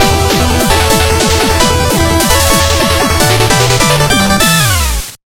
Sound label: Music Arena; Shovel Knight King of Cards